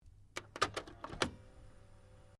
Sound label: Stereo Akai Mini Tape Deck Open, Multi function music player; Tape Players; Electronics